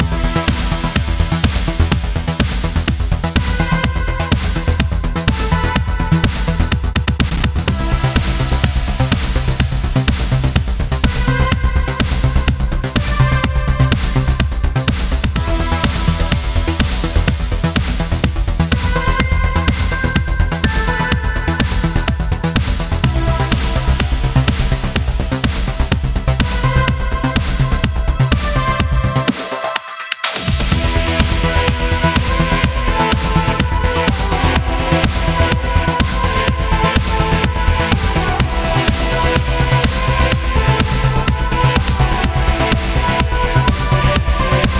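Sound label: Fight 4x4 D major; Challenger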